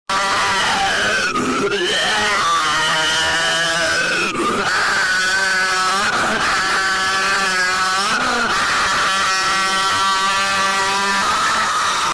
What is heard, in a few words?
screaming